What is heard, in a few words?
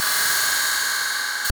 glitch, Digital FX; TRUTH-HZ; Crowdsourced Sample Pack